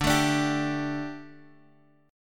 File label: D Suspended 4th, Guitar; strummed